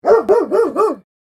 Large dog barking aggressive

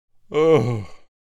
Deep big male yawn; yawn sound effects

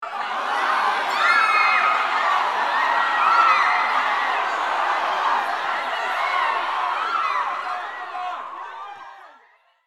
Panic crowd screams disaster chaos

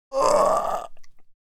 Adult male retching deep groans; Puke and Vomit; Vomiting; gfxsounds

